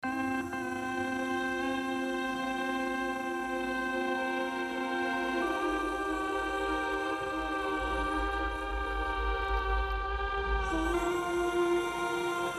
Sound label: Remix Instrumental